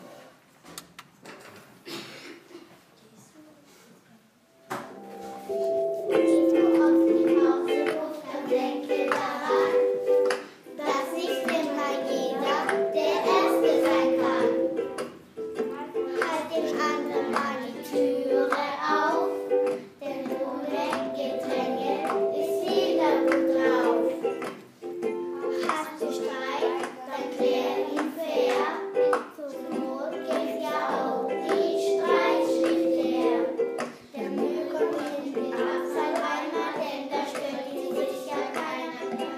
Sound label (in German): Schulgong song